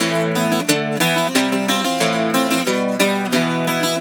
Celtic Flavours; USB Soundscan vol.42; AKAI

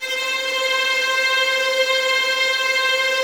VS C 5 Tremolo, 11 Violins; Miroslav Vitous

